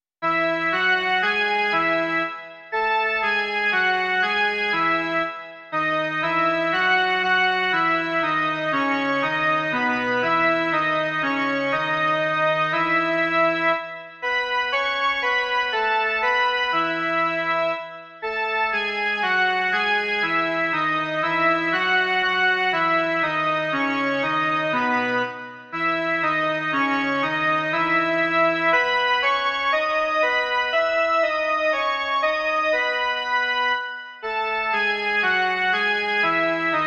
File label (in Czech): jen melodie; Hospodine, všech věcí pane